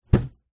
household cool box eskie plastic set down hard ground; zapsplat